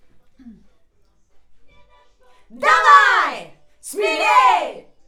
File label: Schoeps AKG, piano